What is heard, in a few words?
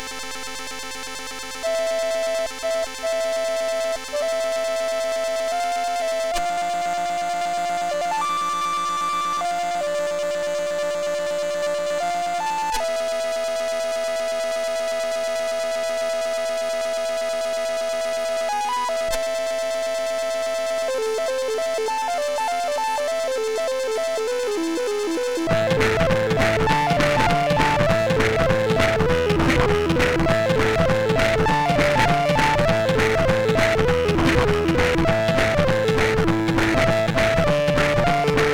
Flute; HVSC; MOS6581R4